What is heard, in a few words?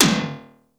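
plastic percussion; Crypton - DrumBase5000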